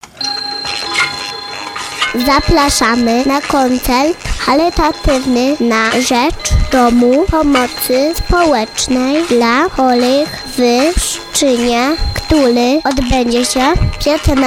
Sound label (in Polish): jingiel